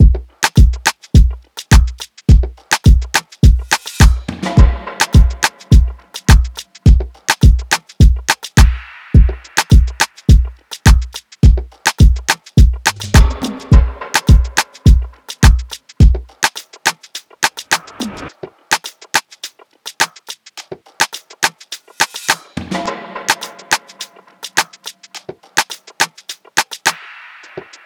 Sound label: Drum Loop - 105 BPM; Lagos; Cymatics - Infinity Beta Pack 2.0; Infinity Drum Collection